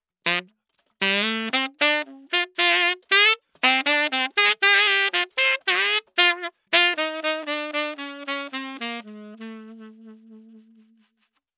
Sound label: saxophone mtp550 dm; lewitt